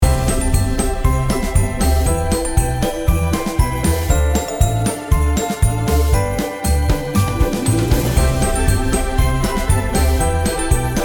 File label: christmas holiday background